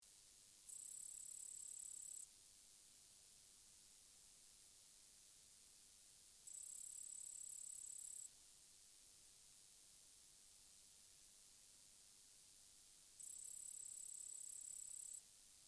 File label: Agnotecous obscurus 3 male calls; students 2016